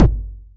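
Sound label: Cool Mini bass drum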